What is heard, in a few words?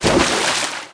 Hit Water; WoTDemo; Angreal